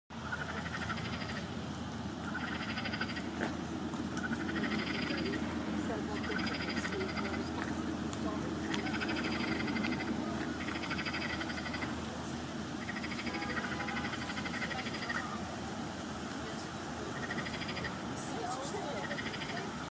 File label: marsh frog